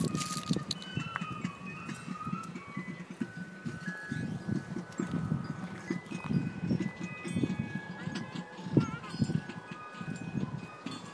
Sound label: marching band practice